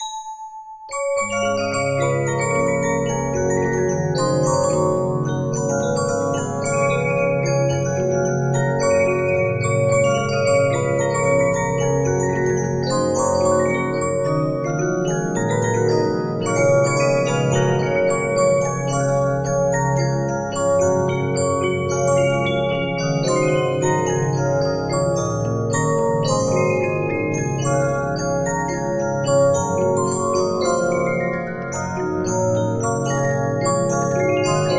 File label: Regina, 15inch disc musicbox